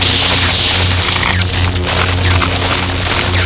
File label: shocker, knife